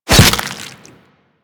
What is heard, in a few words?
Desert Skate Barb Hit Flesh D; Warframe; Attacks; Hit Effects